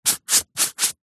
office sponge brush strokes on paper; zapsplat

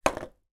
food stackable potato chip tube put down on table; pringles

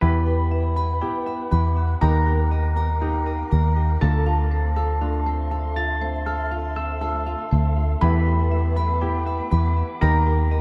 ghosts walk here no drums; zapsplat

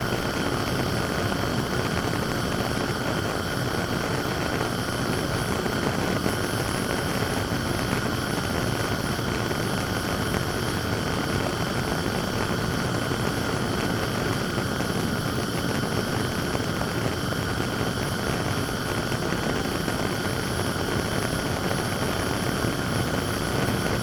household gas stove on with flame

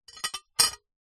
household knife place down on plate; zapsplat

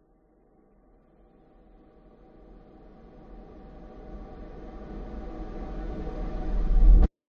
horror riser long dark eerie reversed; zapsplat

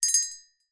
bell small ring

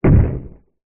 fantasy giant single footstep distant heavy wood; zapsplat

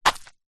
footstep single sandal woods ground stoney path

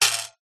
battery compartment spring ping; household

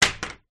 Ping Pong Raquet

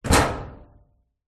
impact metal wall fist; zapsplat

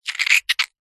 cartoon squelch squish mouth saliva

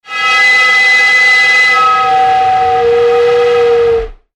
sound design effect air pipe pressure

